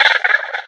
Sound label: box monster chitter